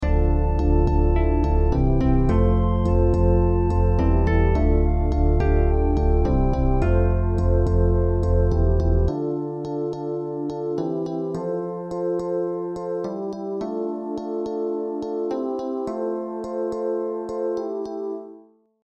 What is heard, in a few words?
beat